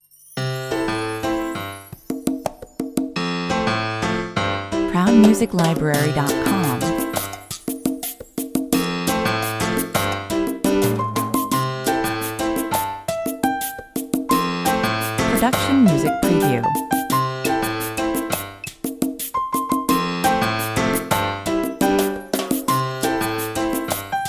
unplugged melody 86 bpm, Nice, short Intro trailer, a piano with a Caribbean groove that sets the mood